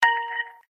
virus ringtone

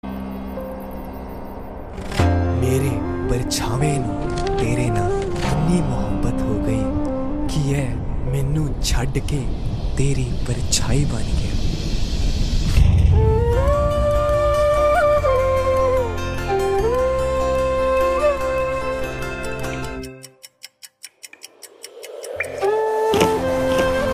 bollywood ringtone

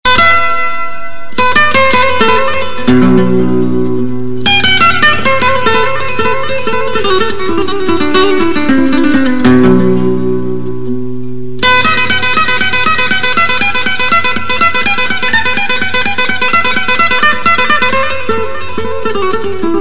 spanish guitar ringtone

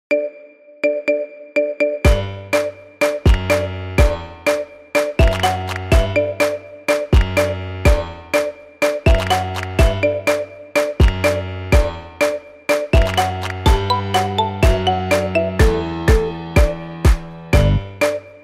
iphone marimba remix ringtone

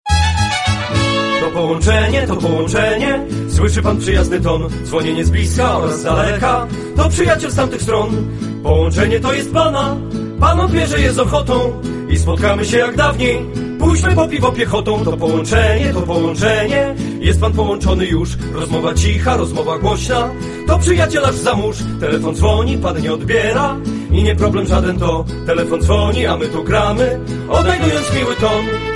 mexican parodia tune ringtone

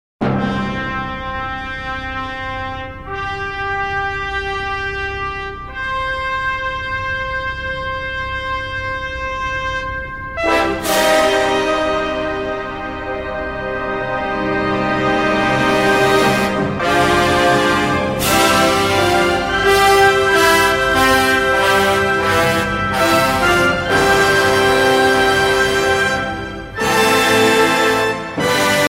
classical ringtone; zarathustra

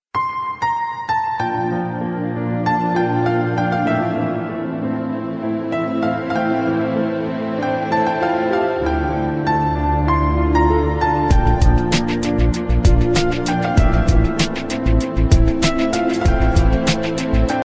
instrumental ringtone

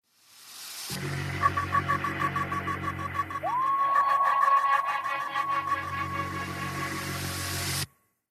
iphone ringtone

